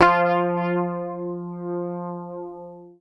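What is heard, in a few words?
bass, F3, right channel; PICK; Sampleheads; Will Lee Bass Library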